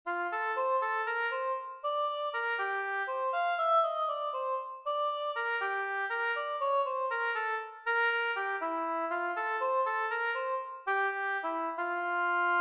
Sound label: oboe